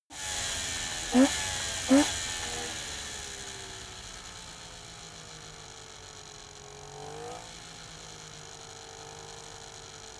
car saab 92 decelerate gear down engine

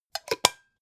pot lid close harsh; objekte; topf

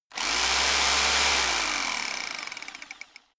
rock drill chisel burst short; schrauben drehen hydraulik

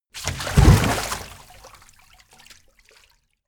splash strong, Badezimmer; haus garten

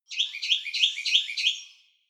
songbird sing

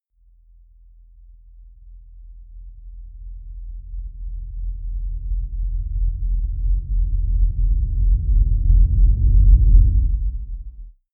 deep noise synth rumble sub, Risers; whooshes rises

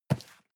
cardboard box medium grab slide; objekte

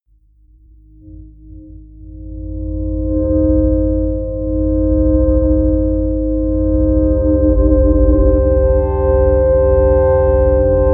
drone beautiful destruction

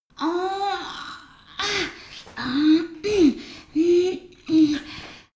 female exert; koerpergeraeusche; menschen; soundpool

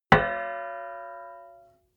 plate hit ring stopped soft

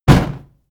fire hit

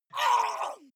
water monster female gargle scream pain; koerpergeraeusche; comedy-cartoon; soundpool

